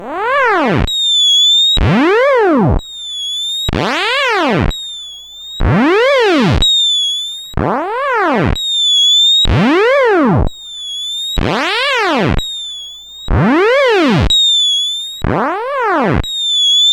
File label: Intro sid CSG8580R5